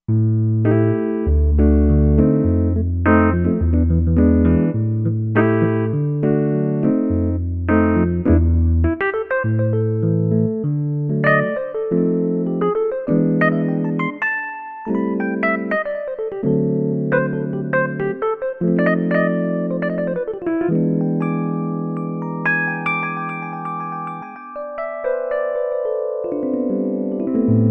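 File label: Suitcase, synth piano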